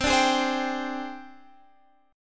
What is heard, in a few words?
Ab Major 7th Flat 5th C